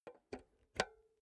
insert xlr multi socket slow, elektronik; objekte

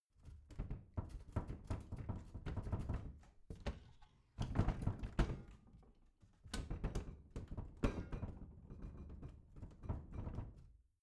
bathroom cabinet door creak rattle

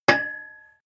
metal impact pole high medium; hits explosionen; metall

